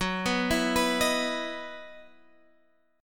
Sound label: E Major 7th Suspended 2nd, F sharp